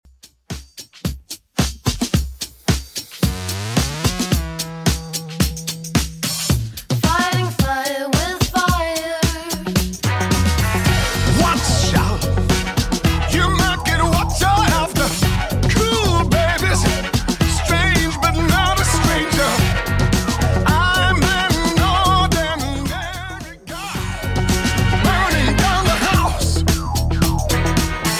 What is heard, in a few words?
90s Redrum 110bpm